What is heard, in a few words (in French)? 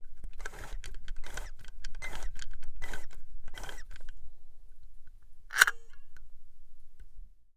REMBOBINAGE REMISE A ZERO